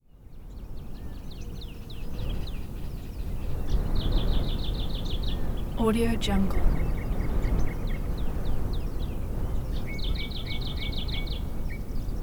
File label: desert ambience